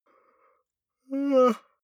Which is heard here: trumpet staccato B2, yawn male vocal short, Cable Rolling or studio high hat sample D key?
yawn male vocal short